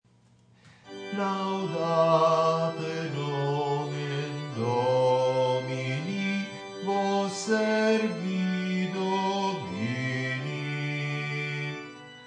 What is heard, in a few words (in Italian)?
bassi